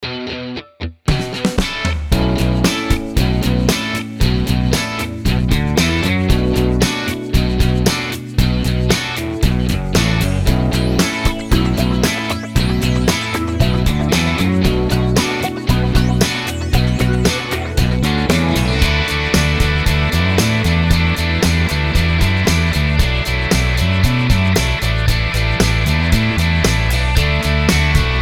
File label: fingers mix